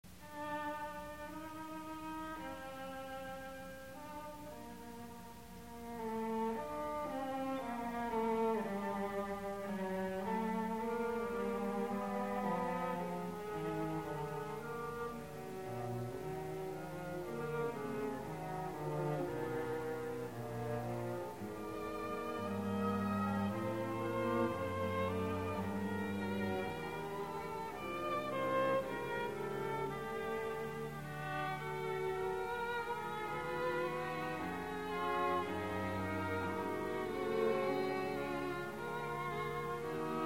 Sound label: fugue